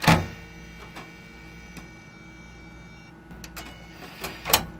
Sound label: eject